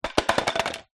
household water sprayer bottle plastic small knock over garage floor some room; reverb